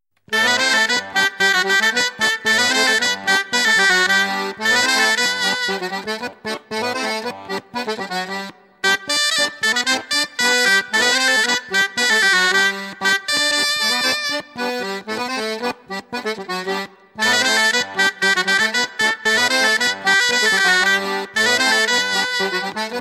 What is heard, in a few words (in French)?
Treger Passe pied